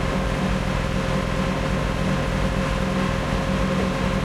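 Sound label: cromwell engine interior rpm; cannons; War Thunder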